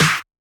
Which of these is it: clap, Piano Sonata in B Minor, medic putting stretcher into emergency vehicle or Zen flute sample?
clap